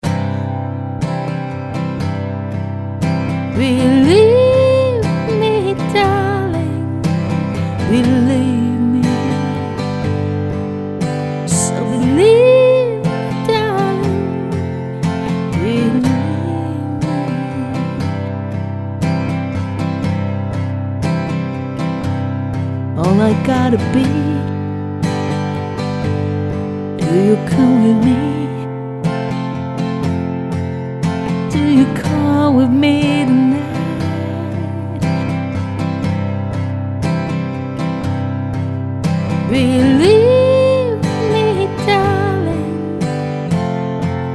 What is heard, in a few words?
Female Vocals